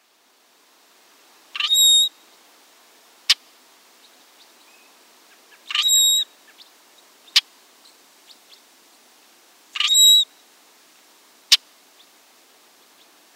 Brewer's Blackbird

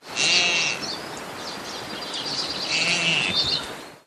Mountain Goat

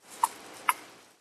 Northern Flying Squirrel chuck sound; PCMD50

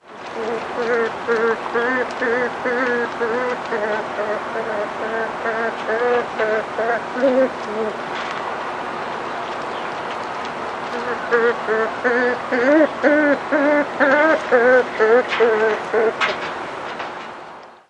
Porcupine

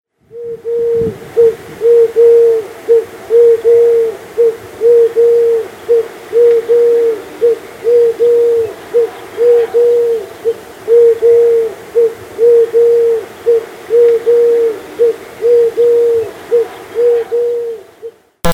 Eurasian Collared-Dove

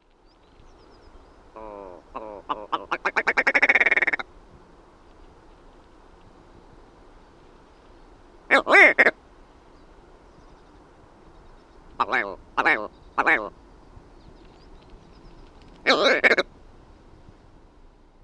Willow Ptarmigan

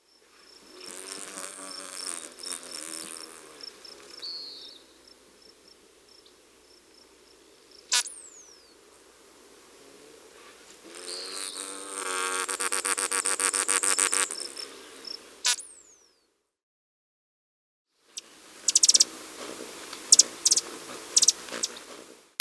Calliope Hummingbird

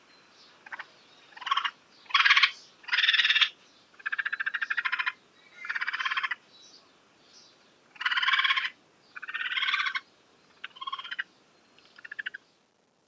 Northern Raccoon chatter

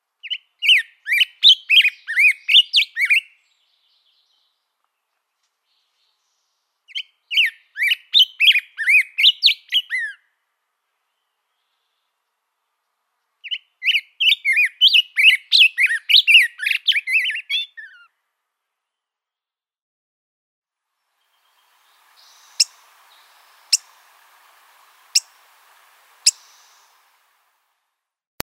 Black-headed Grosbeak

